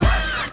Stabs

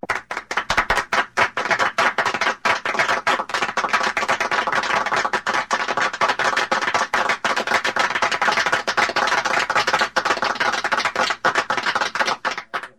applause

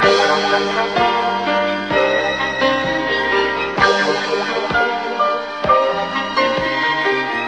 keys

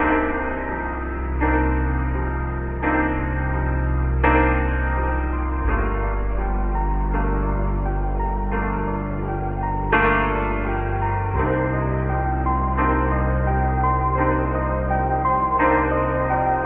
piano, sad loop

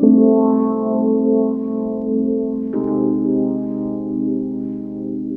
guitar, Loops, Sad

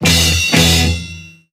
two classsic rock stabs

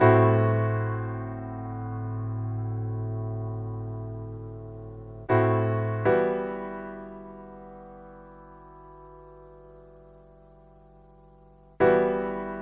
piano, Loops Sad

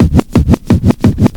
beat bass, Vinyl; Misc Percussion

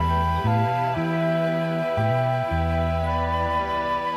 flute, Sad

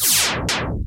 glass shopper, zaps and beeps

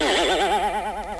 boing

